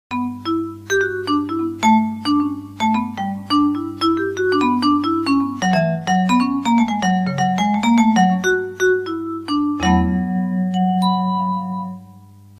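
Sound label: vibraphone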